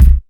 nice steel kick drum sound C key, kick sound tuned to the C note